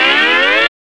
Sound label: SLIDE